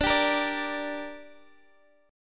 D Suspended 4th Sharp 5th D down Guitar DADGAD, chord